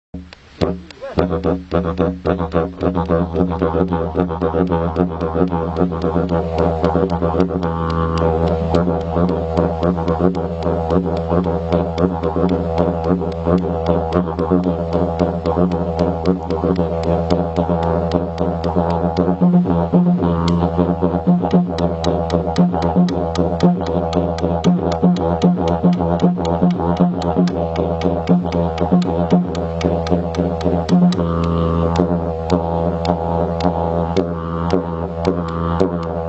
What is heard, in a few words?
The Didgeridoo